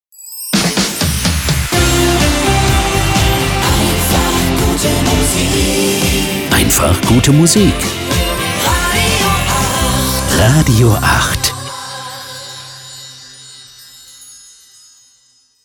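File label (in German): Transition Fast Rock 80er to soft aktuell; RADIO 8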